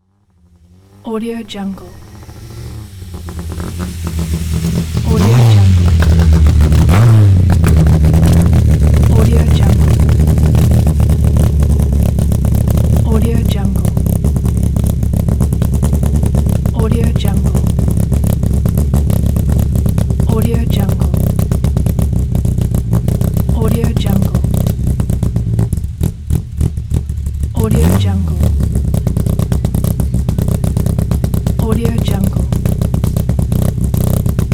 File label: rally car idle small revving engine stop; debsound